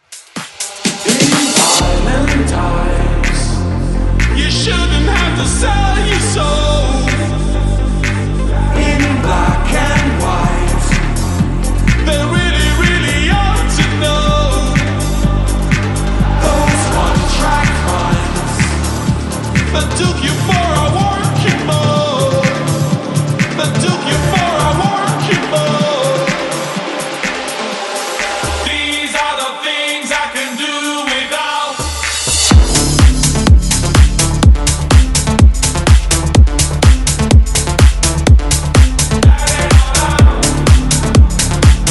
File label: Future House Edit